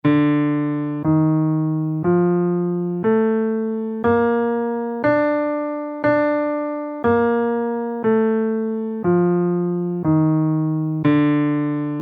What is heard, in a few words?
balinese pelog scale in Dm